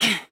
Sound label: pant; hajime; drv3